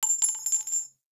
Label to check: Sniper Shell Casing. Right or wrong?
Right